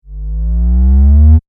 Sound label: Laser Beam Power Up